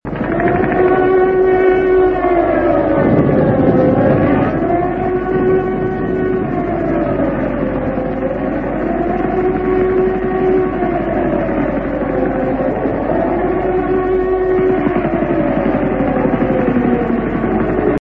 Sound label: air raid; 1944